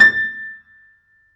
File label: FF A 5 off, Grand Piano off mic; AKAI S6000 CD-ROM - Volume 2